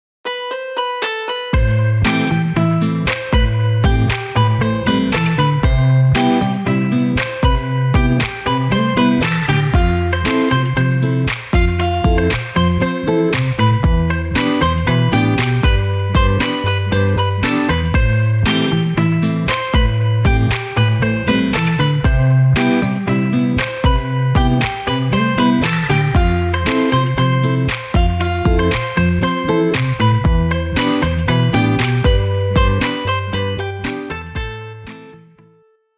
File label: guitar